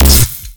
MagicItem Sparx Shoot

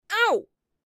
anti ow

